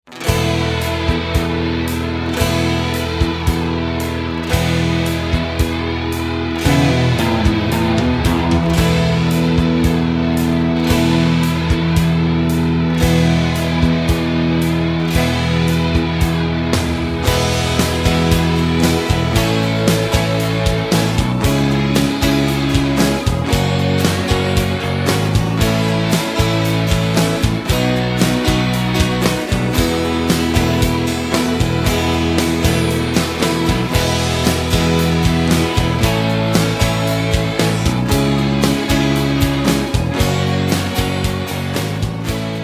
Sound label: (E) No Backing Vocals